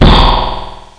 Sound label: vibrating